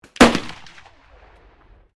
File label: Rifle 30 30 lever action firing; Rifles, non military; Guns